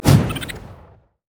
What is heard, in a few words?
jack in the box; jester